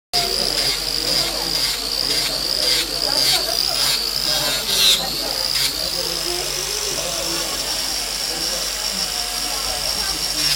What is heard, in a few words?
trimming hoof with angle grinder